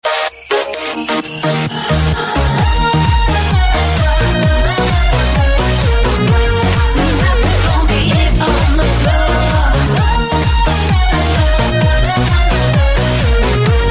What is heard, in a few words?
dance iphone x ringtone